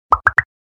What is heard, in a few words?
Cartoon accent popping